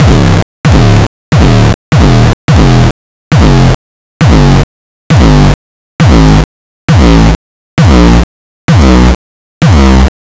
909 Dist Reso Crushed; Mbase11

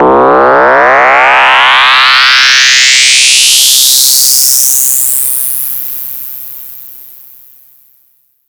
SYNTH FX

